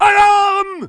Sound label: axis flag